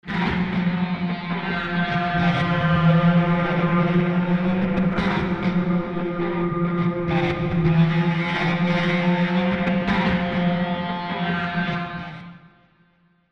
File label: Scoring Bass Guitars Pads; Furious Road